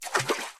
Waterhit, water hit; Friday the 13th: Killer Puzzle

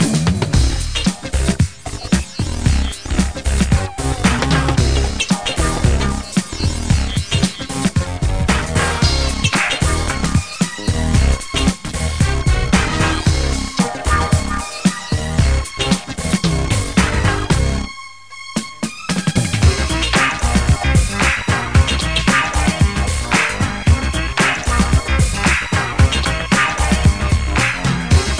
Funk; A2 Racer III Europa Tour

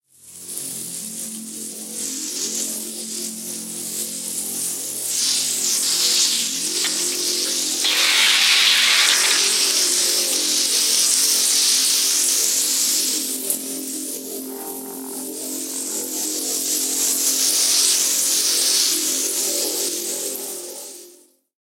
electricity damage futuristic

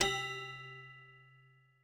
toy piano

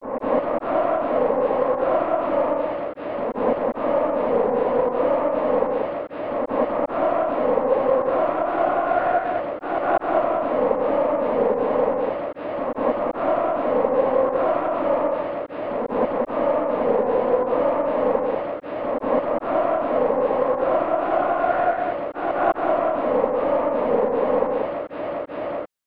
Crowd Chanting; kick off 3 1994 snes

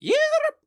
teleport; Gorb

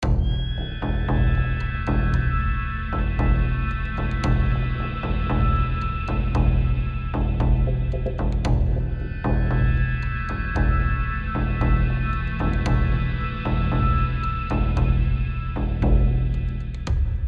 Tension High Chunk

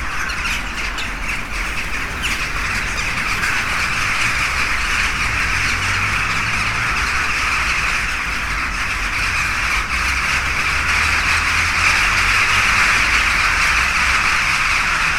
horde of crows looping; a dump in the dark

